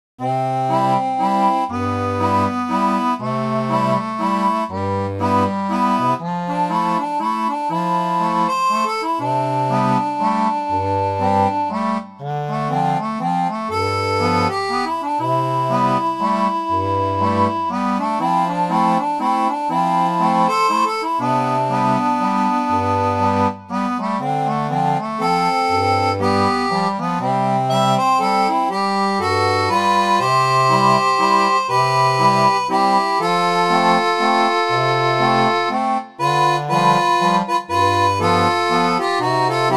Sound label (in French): seraphone, orgue barbarie